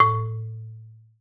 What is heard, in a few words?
Marimba A1